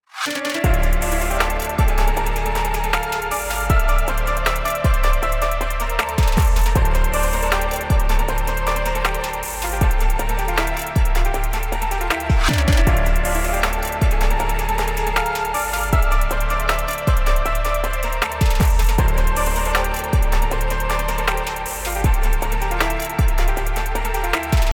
turkish marsh; יצירות קלאסיות שנהפכו לטראקים

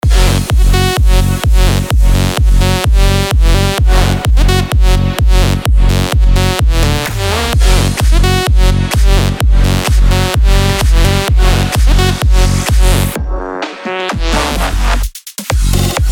big room

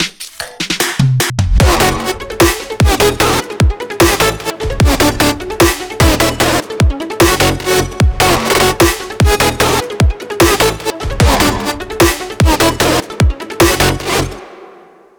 futurebass

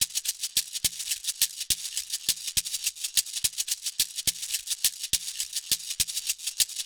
perc loop sekere 3 4 time

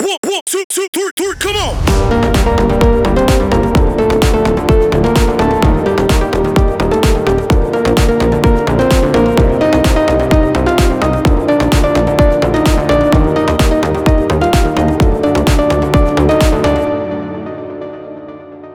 chords

